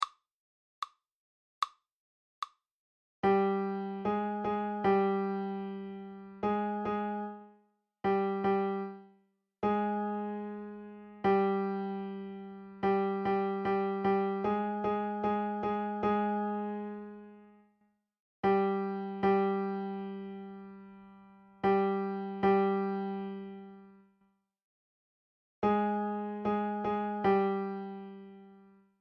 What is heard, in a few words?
minor 3